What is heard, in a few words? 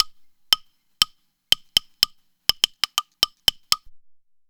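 WOODSTICKS GROOVE; Best Service; World Colours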